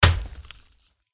orc skeleton attack contact bottom

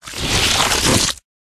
dodge; chervj boss

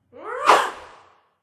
boss elf attack voice mid

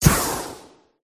cat attack contact

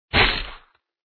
attack contact bottom; norak

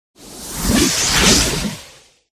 witch death

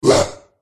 skeleton wound; ruccub

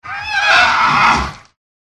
mini dragon attack voice bottom

